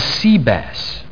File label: BASS; sony; payoff